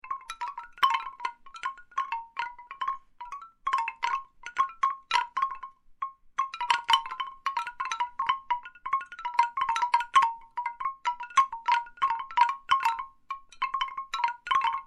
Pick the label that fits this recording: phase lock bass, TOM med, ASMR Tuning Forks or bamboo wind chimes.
bamboo wind chimes